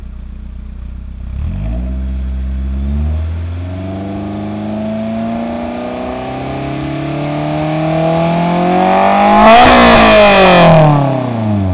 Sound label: rev 6k vtec, s2000; s2000 modifications parts